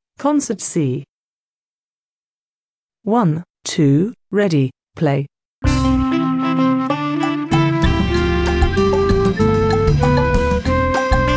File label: concert c scale